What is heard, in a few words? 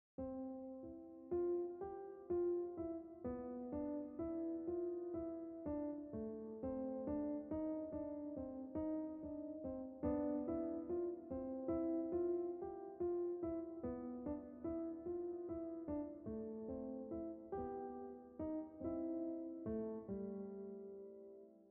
Cool Breeze Waltz